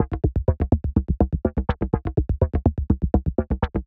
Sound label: 124 Cm, Melodic Loops; M8; Electronisounds